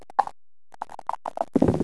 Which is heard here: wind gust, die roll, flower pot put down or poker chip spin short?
die roll